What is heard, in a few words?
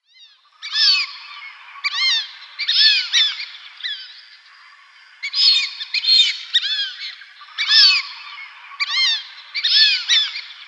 Fulvous Whistling-Duck